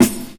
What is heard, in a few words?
sparkle acoustic snare sound b key